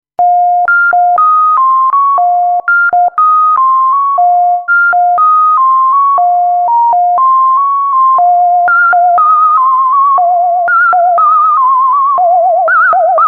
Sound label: reso osc; Lancet